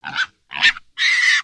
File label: Pets Warthog Ecstatic; creatures; Lego